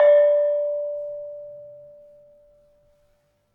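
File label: Barung D5, gravity harps tuned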